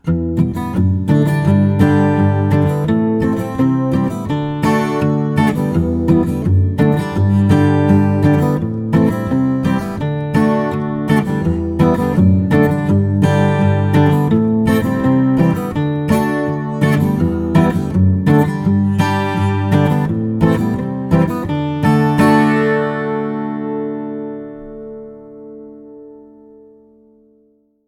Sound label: Country Folk